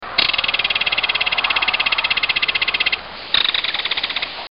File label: kingfisher bird sounds